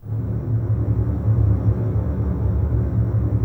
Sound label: D 1, String Ensembles Basses Tremolo; Miroslav Vitous; 90 s Sample CDs